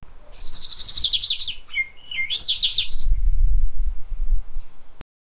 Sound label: bird wigs fly sound